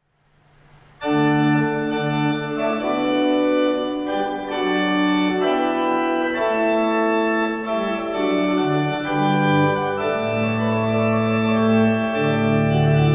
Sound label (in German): Orgel, pfeifenorgeln